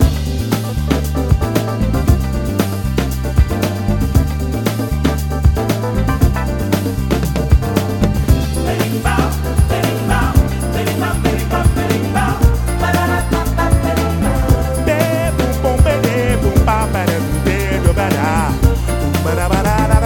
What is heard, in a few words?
Berimbau